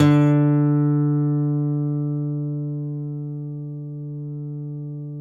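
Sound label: nylon, FLAMENCO, Guitars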